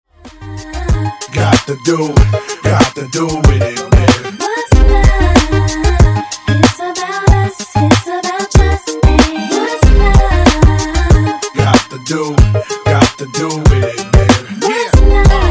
Love Funk Segue 94 126Bpm

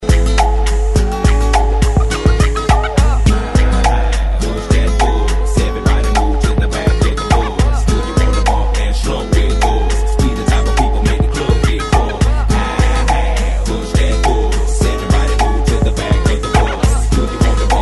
90s Intro 104 Bpm; DJDOLLS

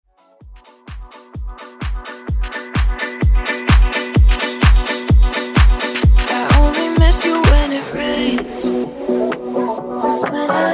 groove house mashup

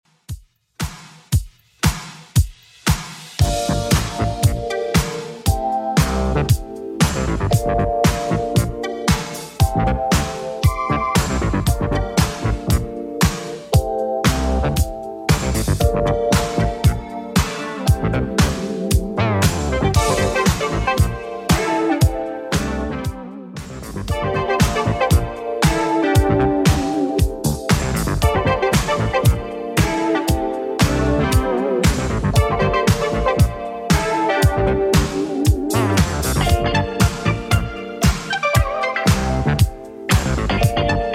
70s Redrum 116bpm

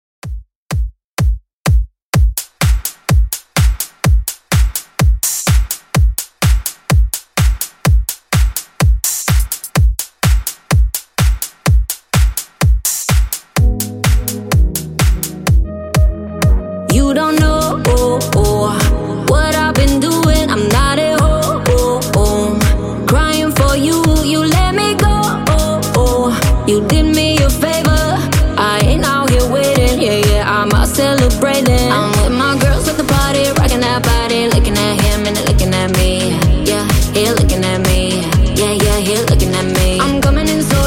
Extended Club Mix 126Bpm

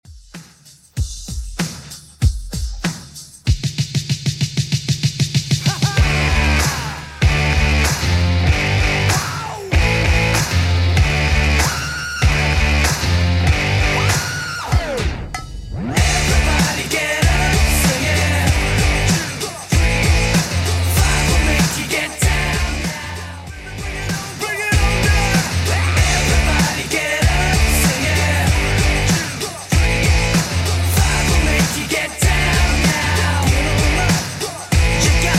90s Redrum 96bpm

